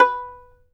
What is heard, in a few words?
fiddle pizz B4 L; Sampleheads; Whole Lotta Country 1 & 2